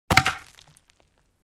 Object falling on a dirt surface hit impact

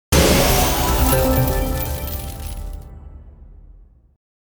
Casino slot machine jackpot big win

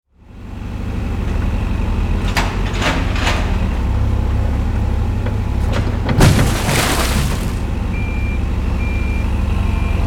Bulldozer demolition debris impacts

